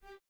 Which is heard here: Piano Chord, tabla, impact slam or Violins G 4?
Violins G 4